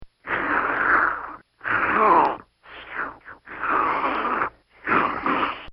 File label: Animal Growls; Elephants; Animals; Bobby Cole